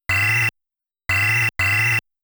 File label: Fygar Flame; Dig Dug